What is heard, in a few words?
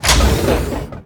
Gun Weapon Pickup; Pickups